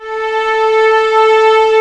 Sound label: VIOLIN A 3B; AKAI S-Series CD-ROM Sound Library VOL-8